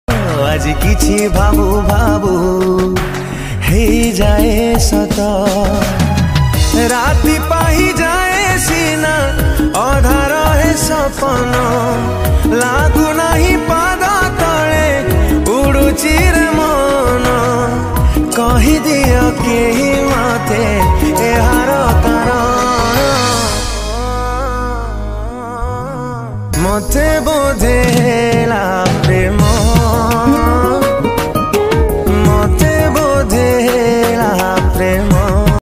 Odia Romantic

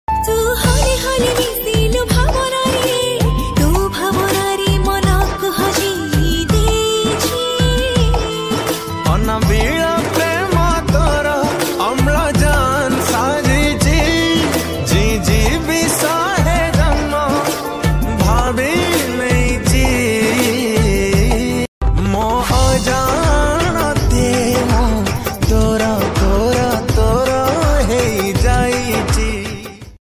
Odia Romantic, Odia Ringtone

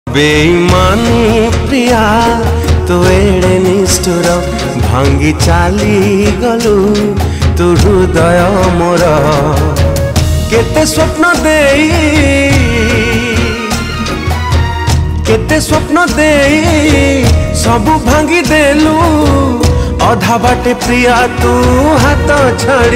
Odia Sad, Odia Ringtone

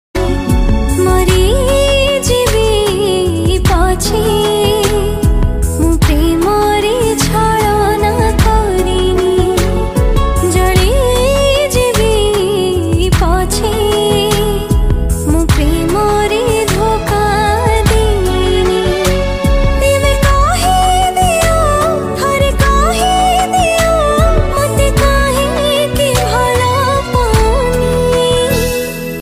Female Version, Odia

